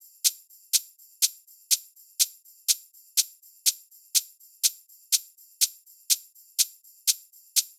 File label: Hat loop, Big EDM Drum Loops 123 BPM; Future House; Future House Tools